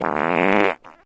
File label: fart medium